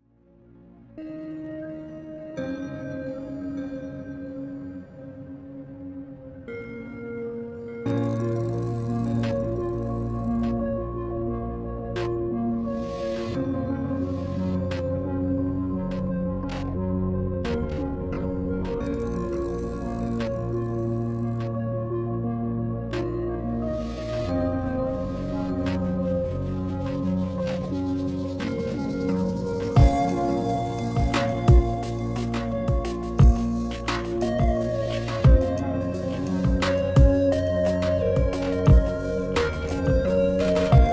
Relax The Dreamy Atmosphere Electronics For Ringtone ultra SLOwed